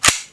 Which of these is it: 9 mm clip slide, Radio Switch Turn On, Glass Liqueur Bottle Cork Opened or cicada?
9 mm clip slide